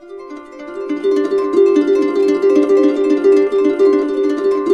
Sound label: D, Harps; Complete Harps; USB Soundscan vol.62; AKAI